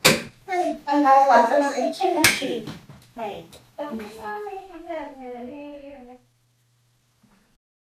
a fun comedic sound; comedy

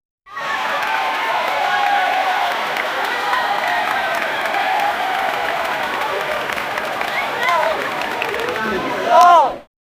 indoor pool crowd cheerin

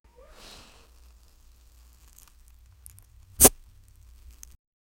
losing a life, failure

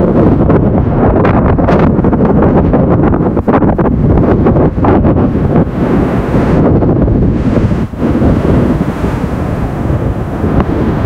wind into microphone nois; noise

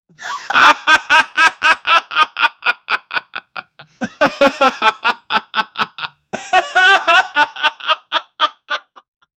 two mens laughing, chuckling

